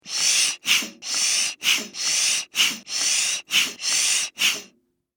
Extermination, Bee, Smoker, Pump, Multiple, Pesticide, Insect, Bug; Blastwave